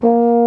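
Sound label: baritone A#2 horn; InVision Interactive; Lightware VOL-1; Instruments & Percussions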